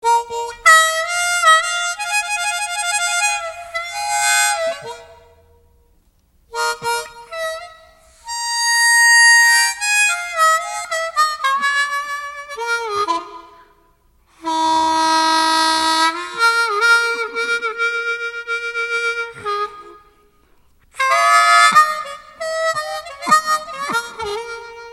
hip hop, ringtone

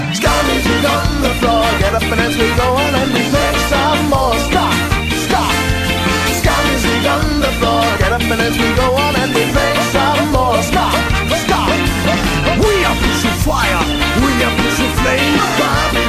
ska music

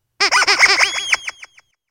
Squeky toy

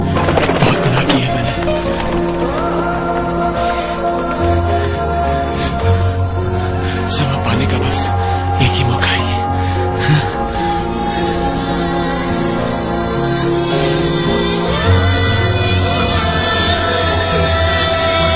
sad tone; ringtones

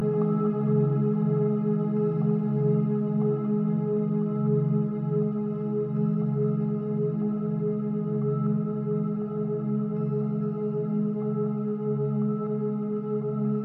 Electronica

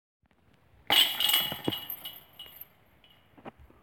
Disc golf ace

